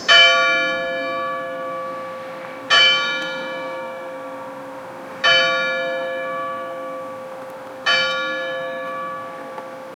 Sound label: church bells play westmin